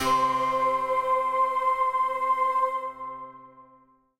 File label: note b4; Bunnicula - Rescuing Harold